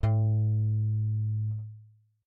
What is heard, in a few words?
a1 bass; matching game